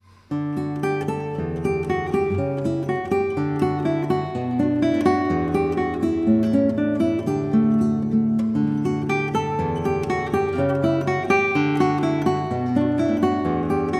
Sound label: chanson; viellotte